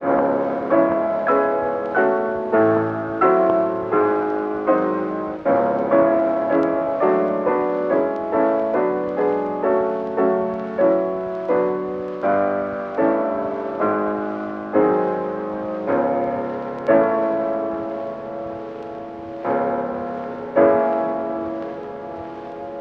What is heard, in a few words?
Prelude in C sharp minor op 3; loc-edison